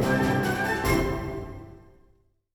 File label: Music Menu 144bpm Stop